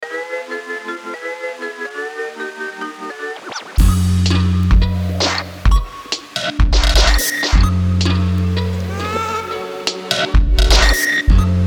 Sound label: bugpowder circuit beat